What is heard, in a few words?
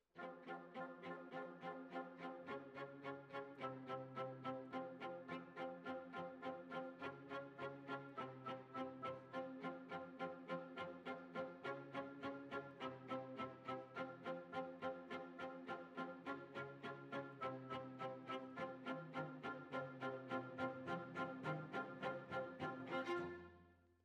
Violas, jazz for piano